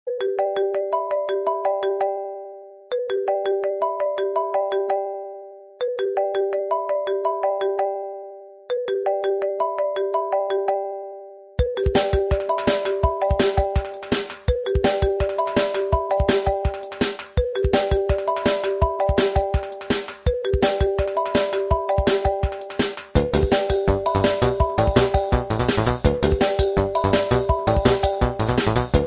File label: iphone, ringtone; apple